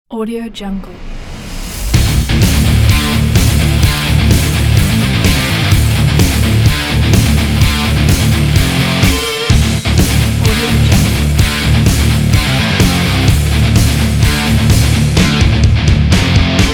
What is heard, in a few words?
hard rock